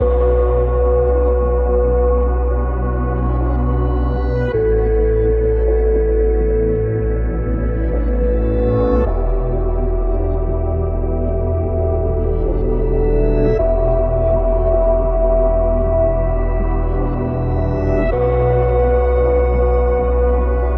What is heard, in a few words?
Ambient Granular; Ambient Vol 4 Music Pack